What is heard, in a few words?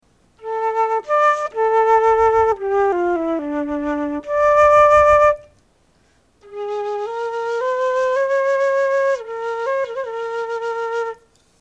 metal wood flute